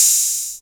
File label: kit hat; E-MU Formula 4000 Series Vol. 2; OrbitPercMaster